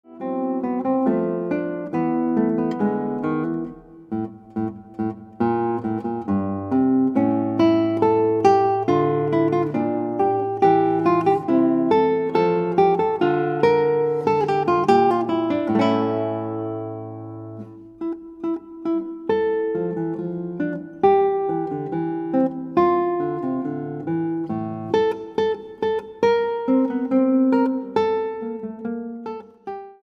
Guitar

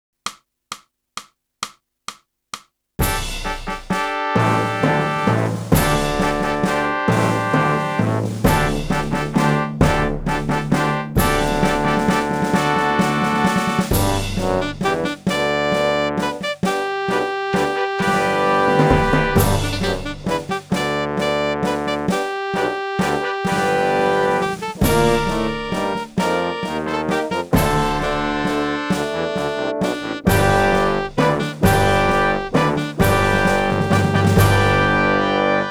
sax

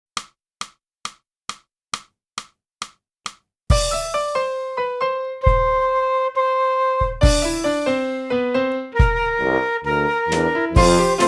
flute; Boosey Woodwind Method: Flute (Book 1)

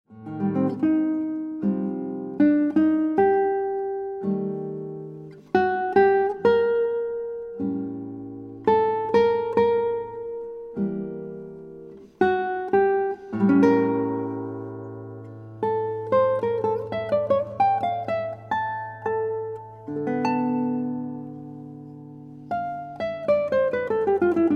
Guitar

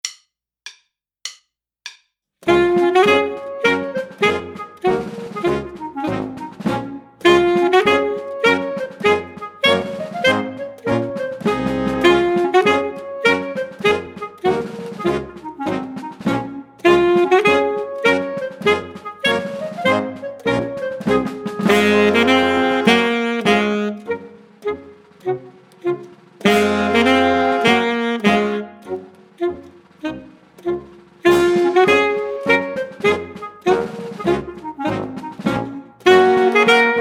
Soldiers March Full Sax

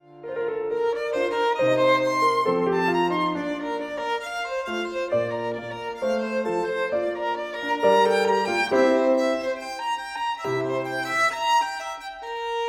VIOLIN

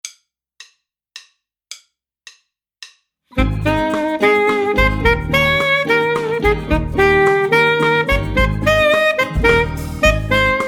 A Syncopated Duet Student Sax

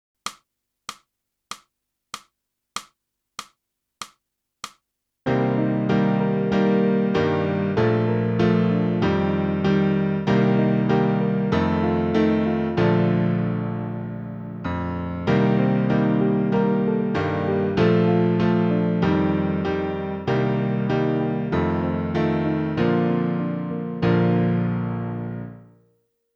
clarinet; boosey method

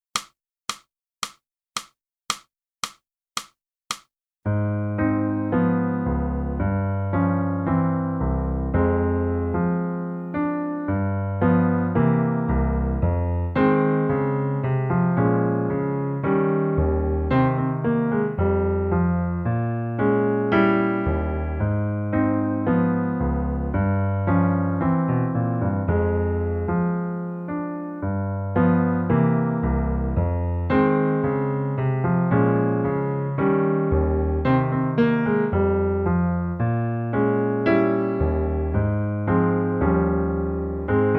clarinet

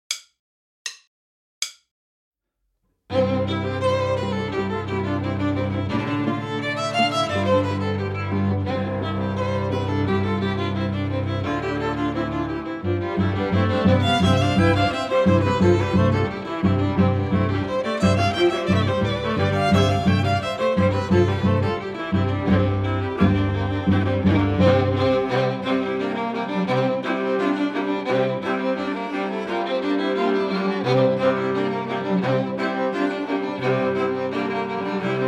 reel minus Violin II

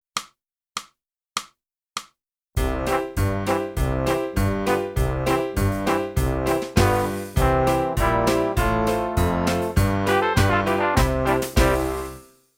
flute; boosey

